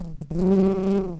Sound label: Pascuorum workers, bumblebees